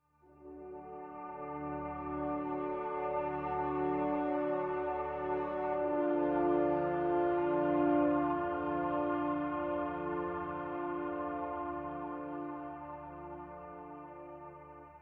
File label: ambient